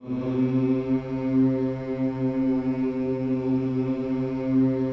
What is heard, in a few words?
HMMS C 3, Gospel; Spectrasonics; Vocal Planet Vol.1 Gospel; akai